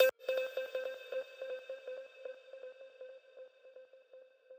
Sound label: arp